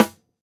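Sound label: snare, Roland MV8800 drum kit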